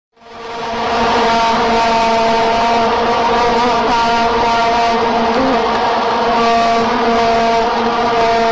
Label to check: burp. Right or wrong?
Wrong